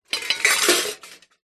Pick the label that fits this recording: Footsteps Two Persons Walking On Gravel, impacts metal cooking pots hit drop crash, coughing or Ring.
impacts metal cooking pots hit drop crash